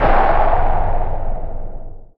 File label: weapon fire